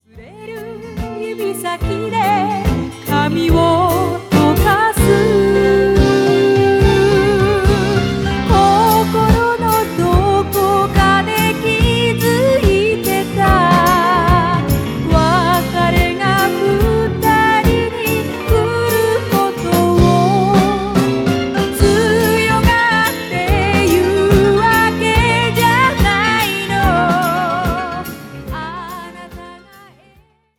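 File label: pop female; stylus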